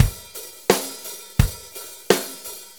4-4 Loop, Drum Loops, Full Kit Loops

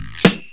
GUIRO SNARE; USB Soundscan vol.27; FILLACOUS

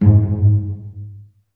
STR SEC, ORCHESTRA; Roland - LA Composer vol. 1

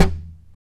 TAP, FLAMENCO Guitars

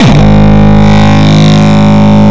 Longkick, kicks

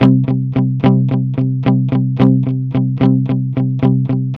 ROCK C 110, Vintage Blues Guitar; USB Soundscan vol.22; AKAI

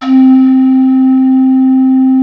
PAN FLUTE C3; PANEFLUTE

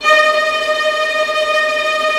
VIOLINT, Strings; ILIO

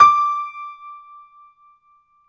PIA MICRO, MICRO-PIANO; Sound & Vision - Gigapack II

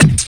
RSS, 160 BPM

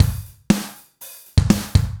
120 ROCK, DRUMS

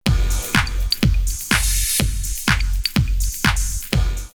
Phase 124, drumloops Techno House; X-Static Goldmine

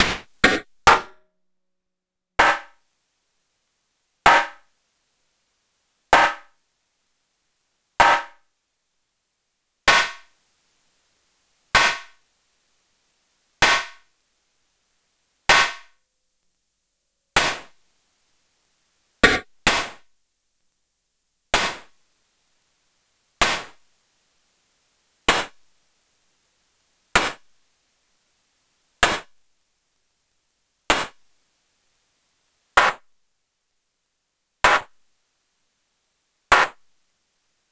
Snare High Quantity

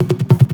filter roll; Zero-G; Total Drum Bass; Hits and Bits

